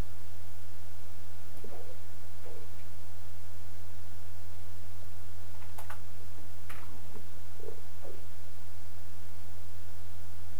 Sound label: noise